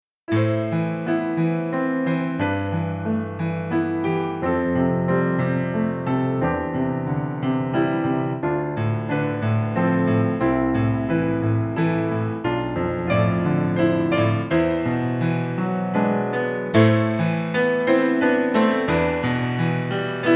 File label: Bechstein 280